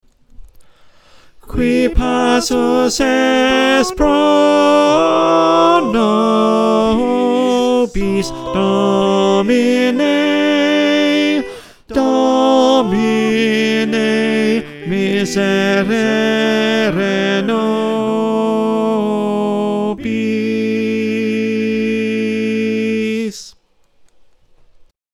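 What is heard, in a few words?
tenor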